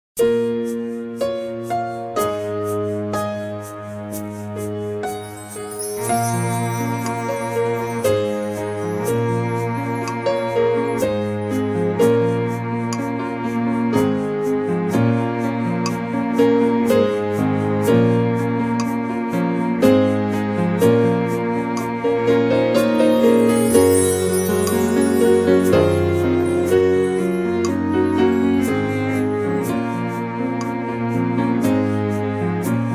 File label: Psalm 100 accomp; HISWords Music